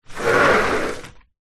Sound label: household screen door insect slide